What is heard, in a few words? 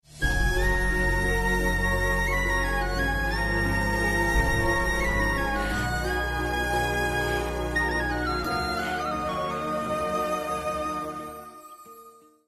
sad bgm Instrumental